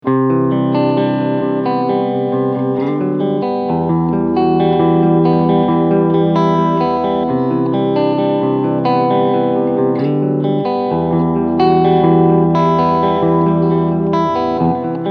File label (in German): Beide PUs Singlecoil Modus